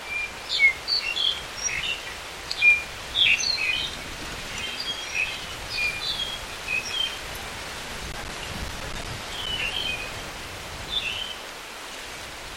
Vinous breasted Starling

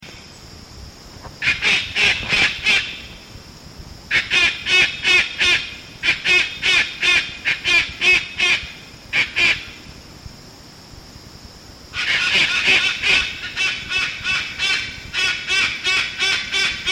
Cacatua alba

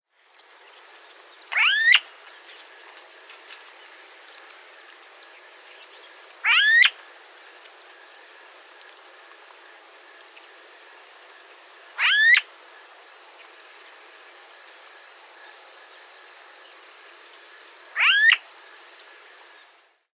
Smooth billed Ani, FL brevard co

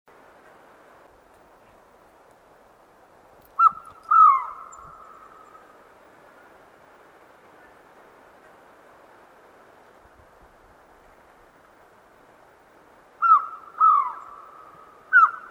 Hispaniolan Trogon

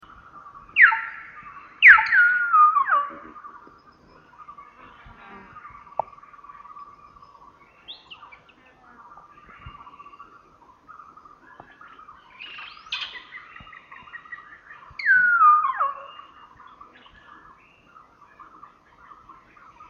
Gracula religiosa intermedia

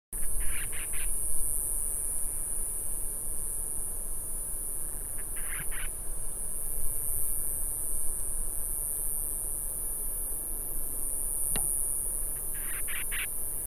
Hawaiian Band rumped Storm Petrel, Waimea Canyon

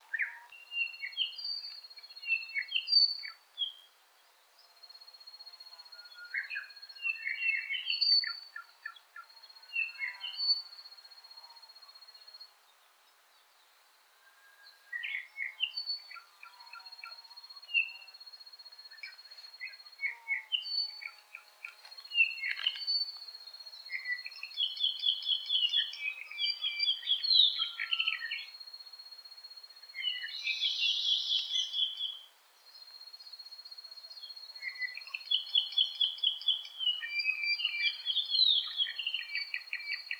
Apapane

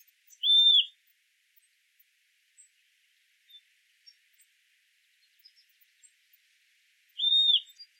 Puerto Rican Flycatcher call; puertoRico

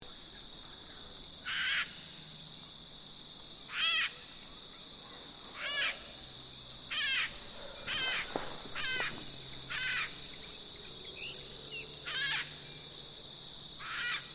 Cacatua sulphurea

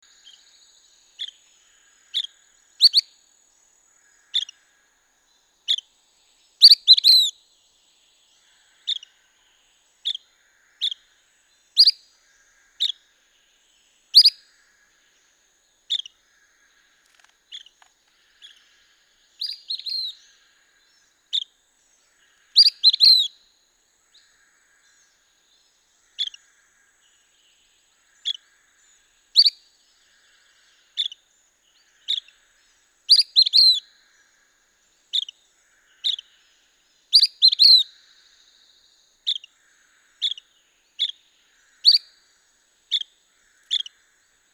La Sagra's Flycatcher

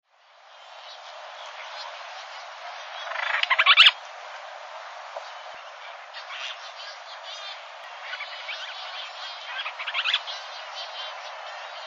Band rumped Storm Petrel Oceanodroma castro

